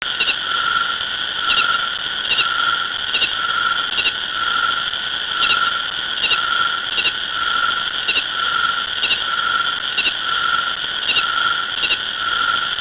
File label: Chord B